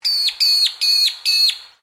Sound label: BIRD MAGPIE LARK (PEEWEE) CALL, Birds in Queensland; Animals